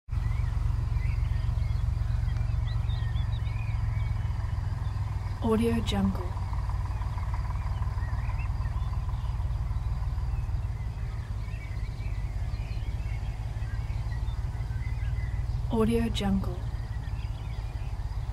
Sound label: tractor in the field